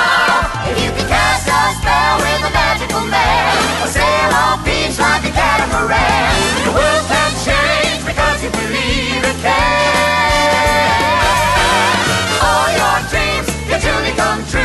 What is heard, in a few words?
soundtrack excerpt